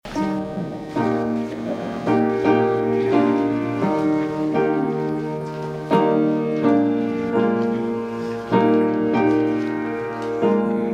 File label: hymn; The Lord Is in His Holy Temple